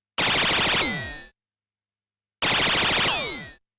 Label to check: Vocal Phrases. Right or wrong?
Wrong